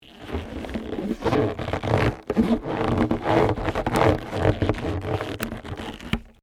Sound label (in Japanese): gishigishi